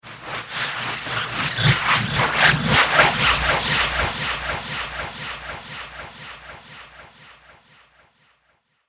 Alien Slurpy Atmospherics; Alien Sound Effects

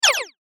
Transition Short Laser Blast; Digital Stutter Ascend; Multimedia; Blastwave

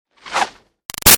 Arrow By Ruffles Slow

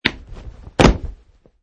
Landing Wood

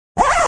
Zipper on small bag; Zippers; Objects

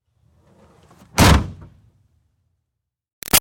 Car Highlander Trunk Close; 2005 Toyota Highlander V6; Cars; Vehicles

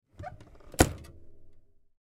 Recliner Chair Recline; Recliner Chair Retract Foot Rest; Chairs; Sound Effects:Offices

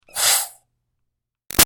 Steam Release Iron Chuff Hiss; Miscellaneous Household; Big Room Sound

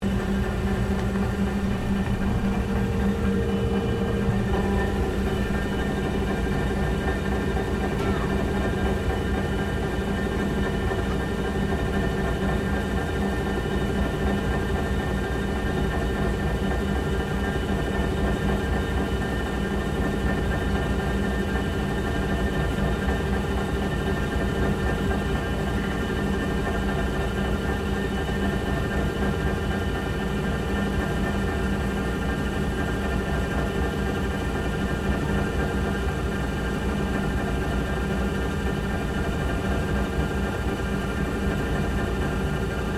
SHIP AIRCONDITIONER LOOP; Machinery; HMAS VAMPIRE

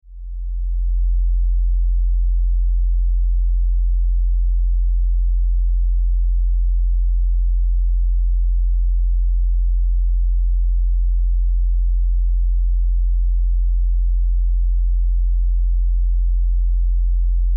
Science Fiction Low Buzz Pulses Deep; Deep Tone Sound Effects; Deep Tones (Sound Effects:Sound Design); SFX Bible 6

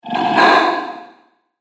Angry Dragon Monster; Fantasy Sound Effect

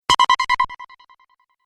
Tap Wrong Tremble, button

